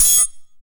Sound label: FX Dance